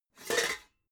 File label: pots clank roomy